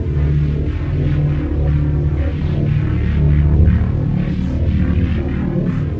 Static Arp; Sonic Foundry (Sony Creative Software); Ma Ja Le Ethereal Textures; Noise Percussion

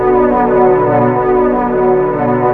PAD SYN; Best Service Gigapack 1

